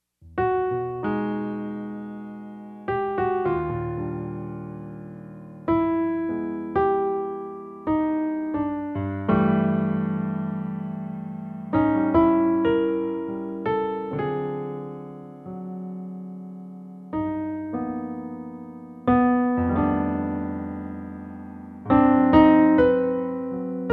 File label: ballad in E Major